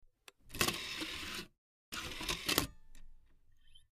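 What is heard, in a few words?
Cd Rom Quick Open Close Whirs, computers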